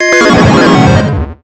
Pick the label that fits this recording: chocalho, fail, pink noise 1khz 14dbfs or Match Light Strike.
fail